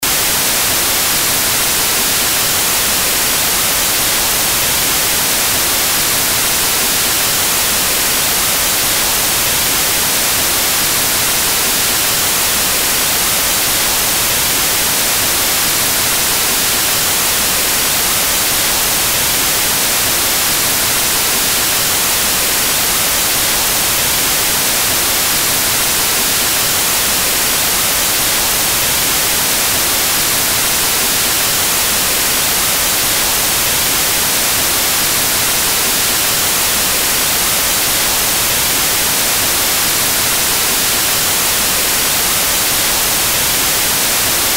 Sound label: noise